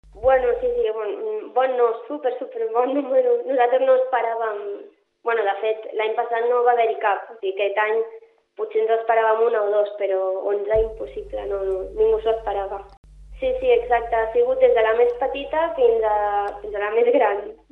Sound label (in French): Mélodie